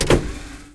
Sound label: cockpit open; War Thunder